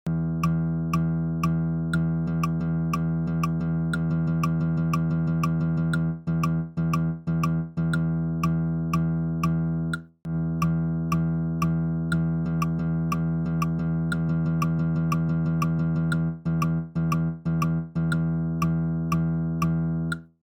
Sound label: triplets; duration and timing